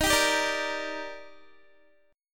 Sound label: Eb Major 7th, Guitar; EbM7; strummed